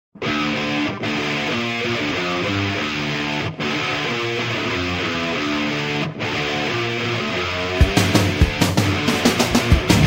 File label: tecnohard